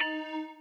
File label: lead flute hybrid d#4; gamerip; lofi room demo